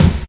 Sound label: thumpy kick sound e key; kick drum samples